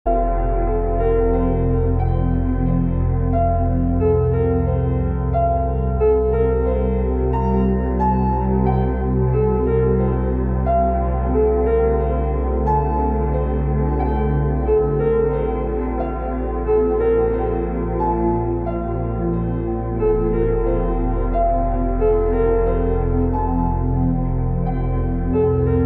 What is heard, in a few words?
ambient; zero bedroom apartment